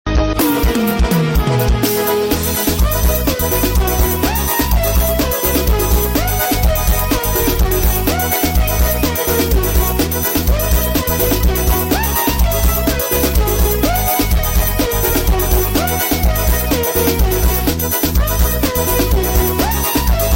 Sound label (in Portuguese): forro